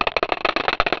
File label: tick